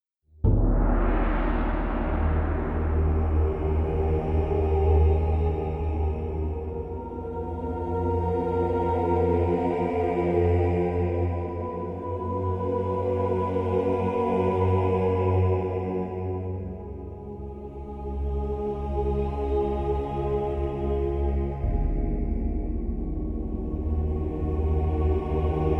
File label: Choral Drama Production Music